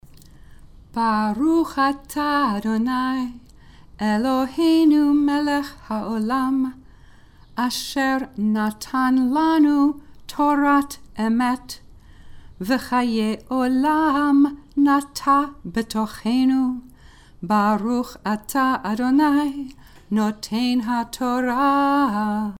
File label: Torah Blessing After Reading Chanted